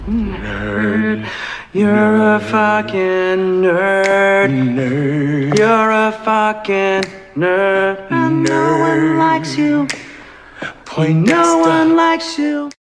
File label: nerd song